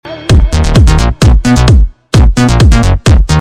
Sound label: Techno